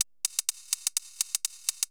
PERCLOOP HOUSE 125; eJay

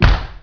floor; CD Action 36; Wcw

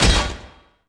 sword

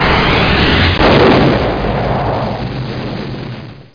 BLAST; Duke Nukem 3D Kill-A-Ton Collection

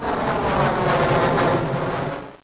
Plane; SimCity 2000

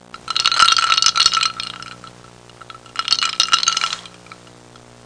tinkling ice in glass; Household; Sound Library 2000 - Wayzata Technology (1995)

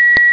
WARNING

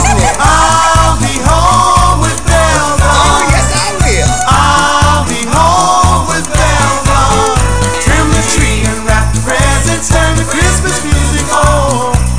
Bells On, Christmas

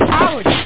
bike crash

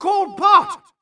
yells

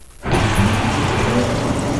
Large Door Start; Gunlok

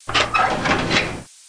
File Cab; Florida State Football - Ultimate Multimedia Experience

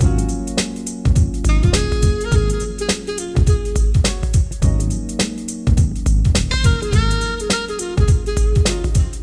sax loop; Odyssey Incentive Vacation Travel Planner

